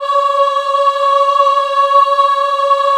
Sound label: C#4 WOM AH, Choir; USB Soundscan vol.28; Acoustic & Synth; AKAI